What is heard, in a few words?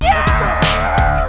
yeoww